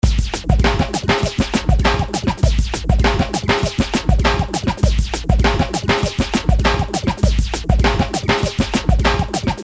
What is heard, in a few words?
Woop Woop 100